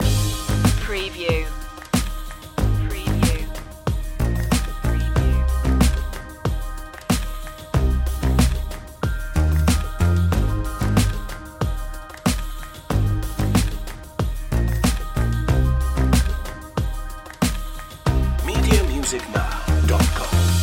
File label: bluestone streets loop